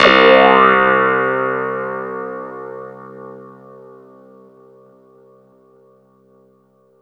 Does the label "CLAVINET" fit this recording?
Yes